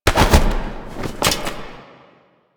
Grab And Choke Foley; Mend Ostron; Warframe; Quests; The New War

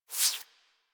foley idle lick furr; Enemies; Warframe; Catbrow

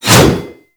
Axe Heavy Swing; Warframe; Weapons

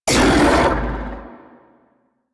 Octopede Pain; Warframe

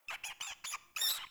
Trapped Hare Call; Encounters; Animal Rescue

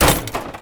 Metal Ladder Hit

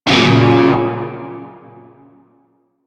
Shawzin Yo Chord F; Music; Warframe; Narmer Shawzin

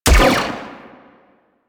Sniper Rifle Shot; Warframe; Weapons; Corpus

